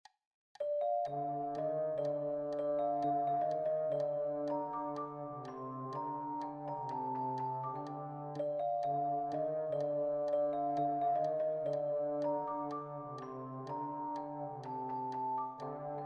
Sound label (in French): Trombone